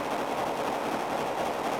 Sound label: Kneeling Station Swirl; Warframe; Ambience; Hub; Tenno